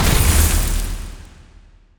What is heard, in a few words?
explosion; spacemap; explosions